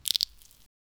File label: hand picking mushroom fro; organic